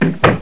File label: FALL; 2000 Sound Effects